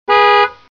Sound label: BMW Horn